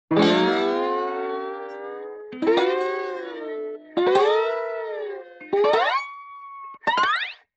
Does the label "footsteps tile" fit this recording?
No